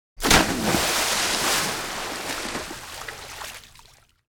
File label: body falls into wat; fights bodyfalls; Asian Show Sound Effects